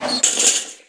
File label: Doors Door Chainlink Move; Counter-Strike: Global Offensive